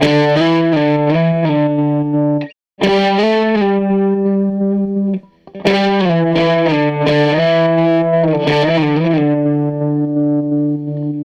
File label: Strat Guitar Loop